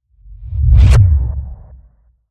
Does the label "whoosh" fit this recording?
Yes